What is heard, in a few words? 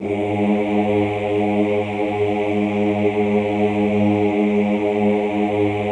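"Ooh" G1 left, choir; Best Service; Extended Classical Choir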